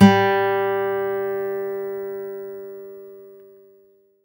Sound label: HRD PICK; Quantum Leap; Guitar & Bass